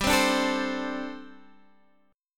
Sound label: G Suspended 2nd Suspended 4th G, down, Guitar, Standard; Gsus2sus4 strummed; JGuitar